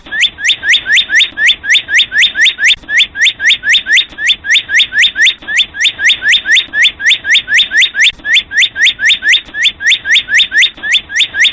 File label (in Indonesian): nada dering burung